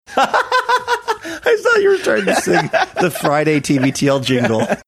laughing saying I thought you were trying to sing

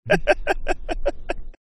short laugh

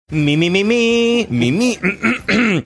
singing mi mi mi mi mi mi clearing throat

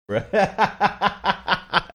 laughing

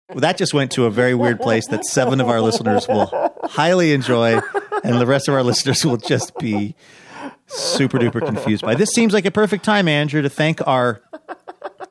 continues to laugh giggle

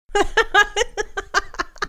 phyllis laughing

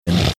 snorting

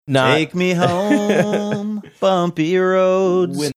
singing take me home bumpy roads

